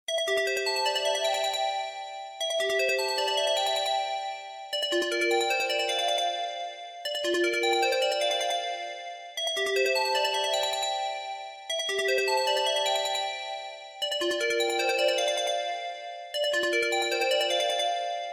sony ericsson remix

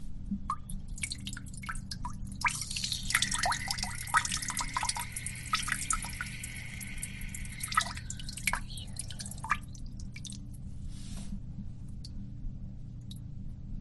girl pees toilet

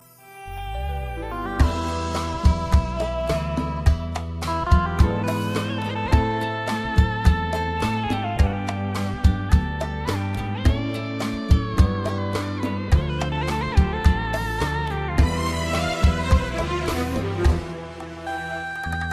korg 800

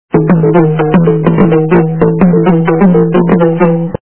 african drums

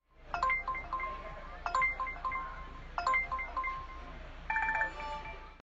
beep; uber

